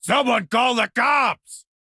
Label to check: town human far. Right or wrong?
Right